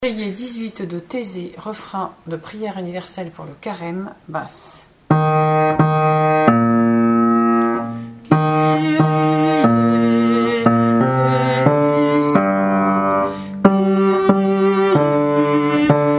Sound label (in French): Kyrie, Taizé, BASSE